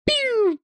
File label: pew